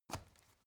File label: step ladder wood single